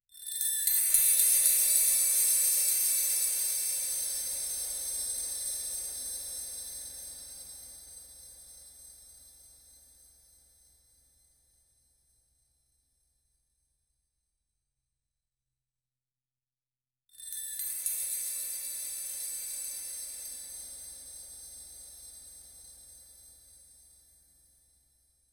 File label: short golden sparkle burs; accent